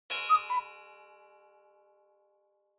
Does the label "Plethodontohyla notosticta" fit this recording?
No